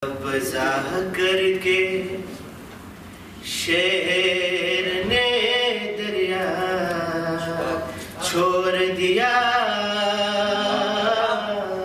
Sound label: kassida; khojaradio